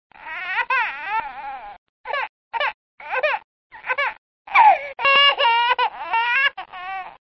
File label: crying child